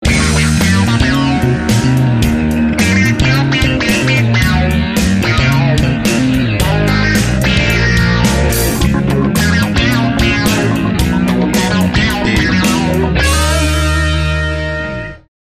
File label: Mousey Cat Funk